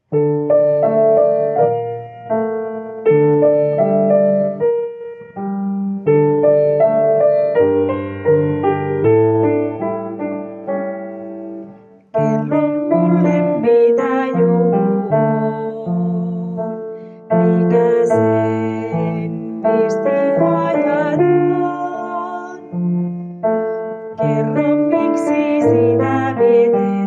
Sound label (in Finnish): Aavistus, sopraano